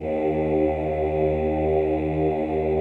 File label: CHO ES1, Ooh; Ooh + Ooh 2